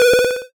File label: Ym Multi Organ B1; multi-samples; CHIPSHOP DELUXE